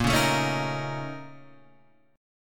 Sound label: A sharp Minor Major 7th Double Flat 5th A sharp; A#mM7bb5 Chord {6 4 7 6 4 6} strummed